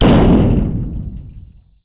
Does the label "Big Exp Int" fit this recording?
Yes